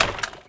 Swinger Stop; Area51; Playable Tutorial Level; TR3

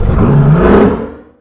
Vehicle Speedboat Accelerate; Playable Tutorial Level; TR2

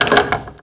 Padlock Break; Playable Tutorial Level

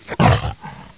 Bear Attack; Playable Tutorial Level; TR1

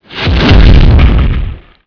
Dragon Fall; Playable Tutorial Level; TR2

